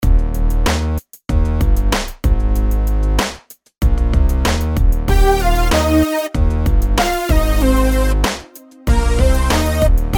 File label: melodies simple Ebmaj; melody